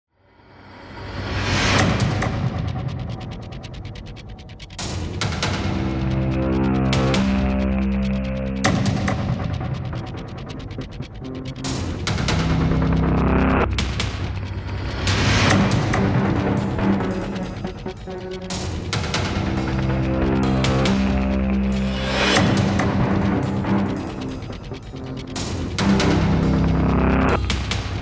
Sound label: Tension